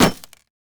vs metal; LoL; jax

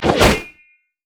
crit attack; Xin Zhao

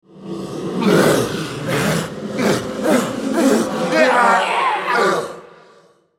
dying; Thresh; en US

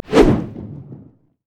barrel roll; LoL; gragas

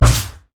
steam golem mana barrier hit; LoL; blitzcrank

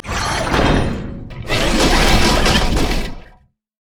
emote laugh; Vel'Koz

